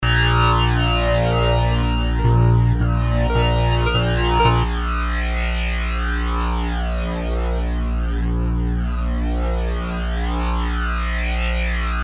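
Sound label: Buz Pad B flat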